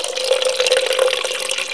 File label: sprayer